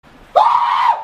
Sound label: Scream; KSI